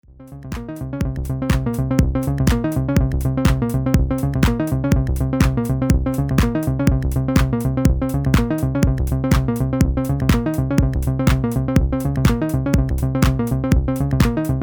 Roland JU06 Midi Clock

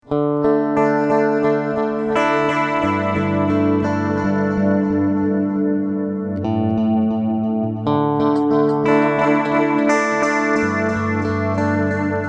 Pure EKO

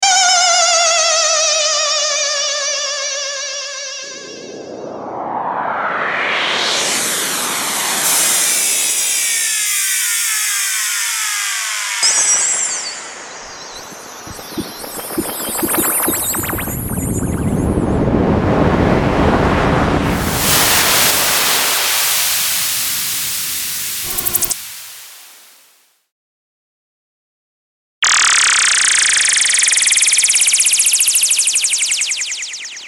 Sweeps

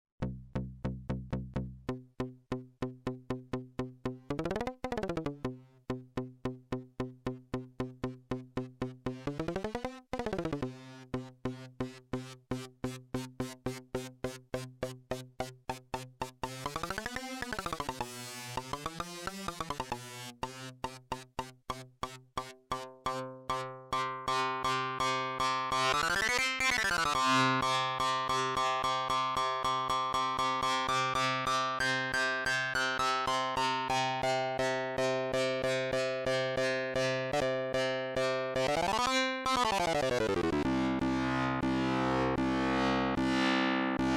MicroFreak Karplus Strong Phys. Modelling

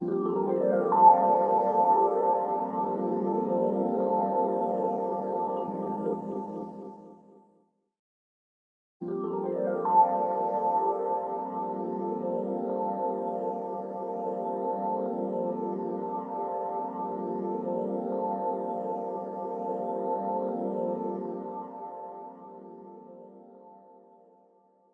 Sampler Back Reverse Loop Pad

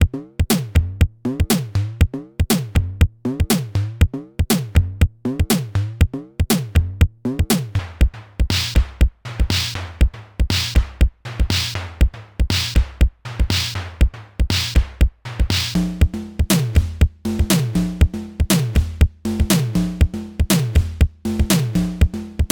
Simmons SDS 1000 User

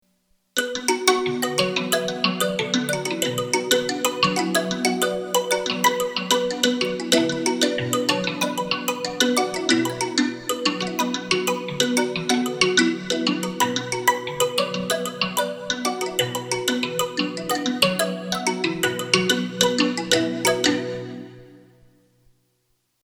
Boss CE 1 Mbira Chorus

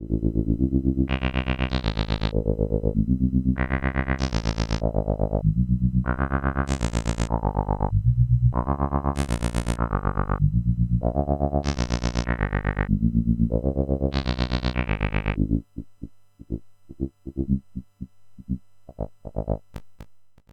LOOP drift; Snazzy FX; telephone game

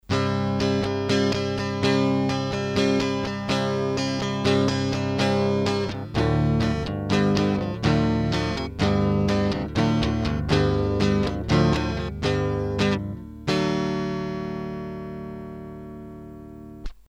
Antilope Zen Tour Gtr clear

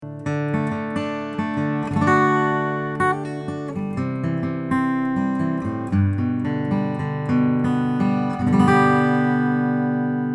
Omni Picking A

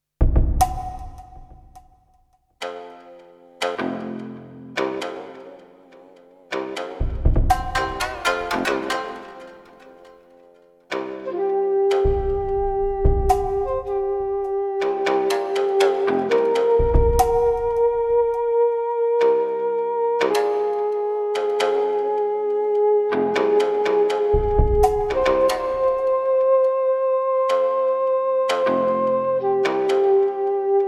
KORG Wavestate MkII Factory Sound; Tea Ceremony

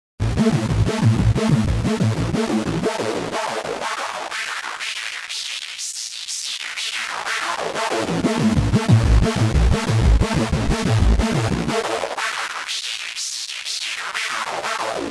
R3 Tech Sound

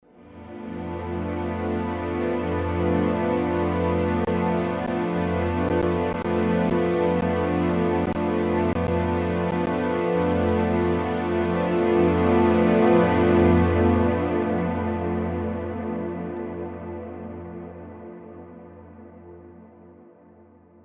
string ensemble reverb; NI arkhis

